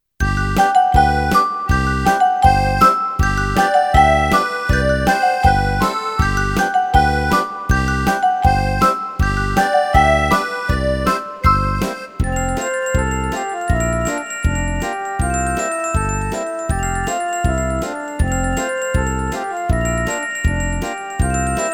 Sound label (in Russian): Танец с куклами, минус